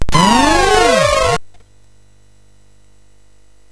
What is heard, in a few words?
FX, MOS6581R3; HVSC; Software House